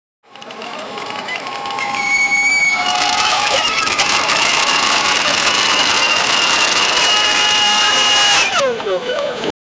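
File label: carnival crash collapsing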